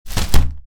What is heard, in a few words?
Large umbrella close